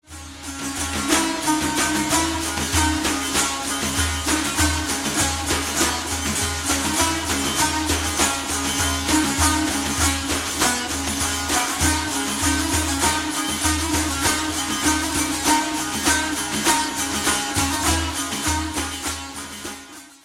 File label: daf tanbur; Tanbour